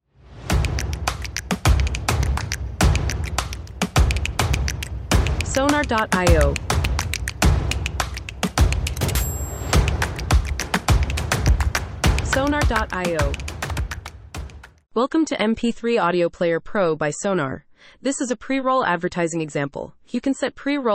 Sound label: percussion